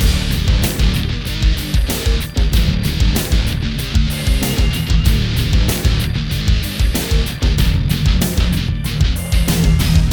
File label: menu loop